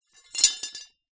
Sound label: animals horseshoes x2 metal down on hard ground